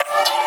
D STAB, Funky Underground

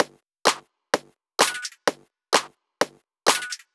Loop, 128 BPM

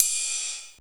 Ride, Dubstep One-Shot Drums

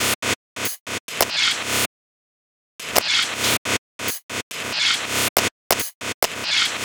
140 FX LOOPS; Loopmasters; The New Sound Of Dubstep

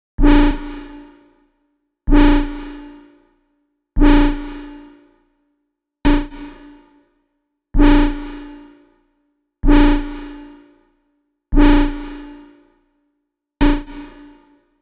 127 G Bass, Complex Electro Synths; Prime Loops; Adversity

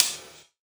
Hat, Dubstep One-Shot Drums

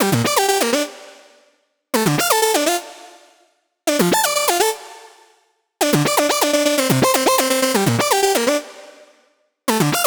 Synths, Dm, 124 bpm, Complex Electro Synths; Prime Loops; Invaders

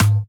Dirty Dutch Percussive; Mutekki Media; Vengeance Electroshock Vol.2; Dirty Dutch Special; Dirty Dutch Percussion

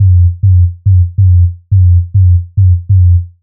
140 F BASSLINE, Dubstep

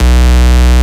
Bass Shot B; Mutekki Media; Vengeance Electroshock Vol.2

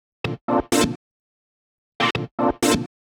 126 G MUSIC; funky; Loopmasters; Funky Underground Vol 2

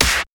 Claps & Snares

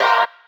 Bb STAB; Loopmasters; Funky Underground Vol 2

